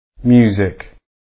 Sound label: music; Flashpoint Ultimate 13; bow-street-runner